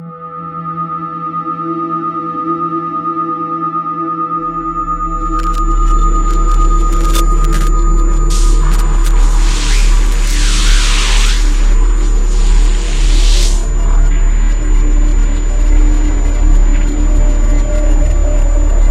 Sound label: BGM - No Signal; Eden Renaissance